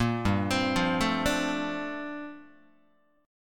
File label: G Minor 11th G down Guitar; arpeggiated; 1,3,3,3,1,1; 4A,4E,5A,5D,5G#,6C#; JGuitar